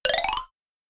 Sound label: notification; samsung Aleart